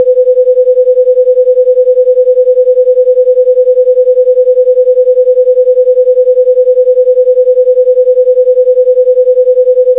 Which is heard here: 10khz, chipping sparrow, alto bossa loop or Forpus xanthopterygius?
10khz